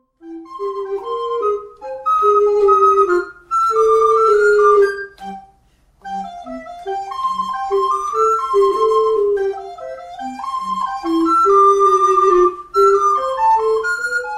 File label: Duos for Recorder